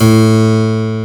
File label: 8FT A2, HARPSCH; AKAI S-Series CD-ROM Sound Library VOL-2